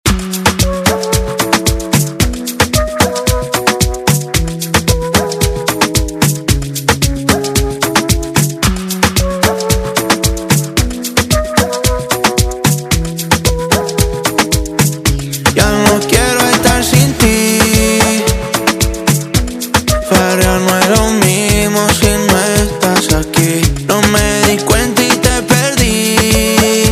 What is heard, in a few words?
Afro Moombah Redrum Clean